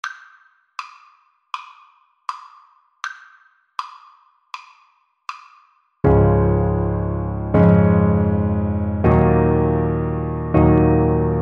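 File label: deep river alto saxophone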